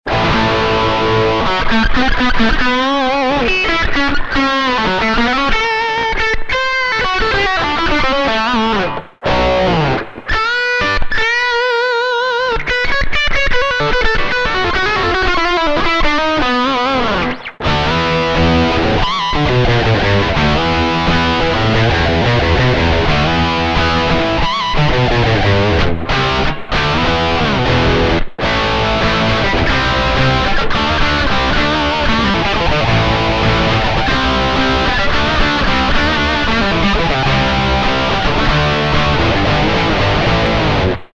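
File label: tesla shark bridge dirty, guitar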